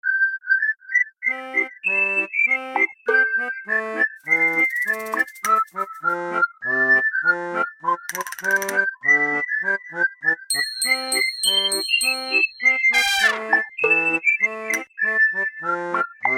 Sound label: Alarm Clock, MIDI; Fun MIDI Project